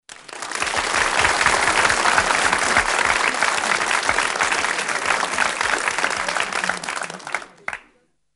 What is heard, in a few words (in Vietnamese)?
Âm thanh livestream